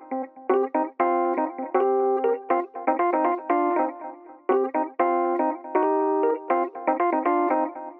El Piano 120bpm Cm, Nu Disco; Zero-G; Construction Kits; Legacy

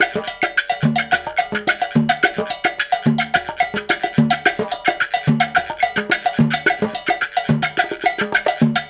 Gua Guanco; Spectrasonics - Supreme Beats - World Dance

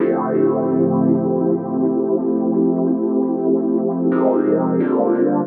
CANAL CHORD; voxandchords

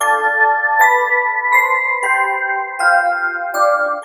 bell lead; Sonic Foundry (Sony Creative Software); Ugly Remnants VOL-2; Leads

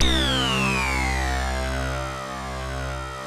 ARP C2, Analog Fantasies; USB Soundscan vol.49; AKAI

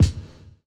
BD; Best Service; Dance Mega Drums

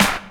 SD, Dance Mega Drums; Best Service

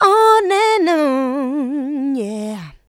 Vocals, Adlibs; Houseworx

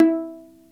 P VIOLA E4; Greytsounds VOL-1

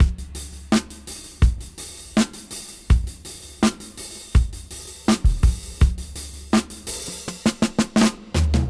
Gresy 82 BPM fill; AMG - Keith LeBlanc Phat Butt Jams

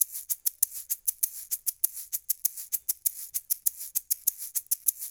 LOOP, SHAKERS, Percussion

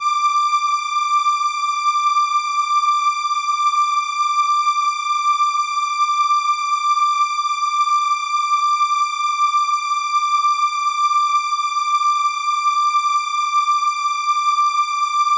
violin D6, ARP Solina

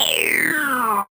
VocoBit Noise, 8-bit; musicradar; 8-bit bonanza

